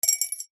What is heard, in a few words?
foley gemstone jewel into glass jar